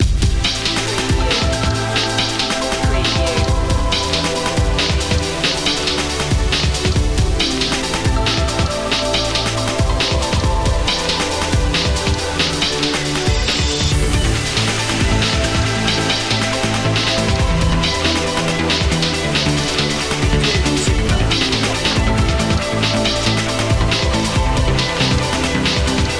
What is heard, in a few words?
trance dnb